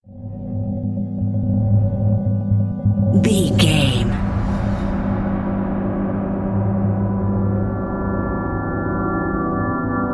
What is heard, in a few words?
gnawing your mind narrative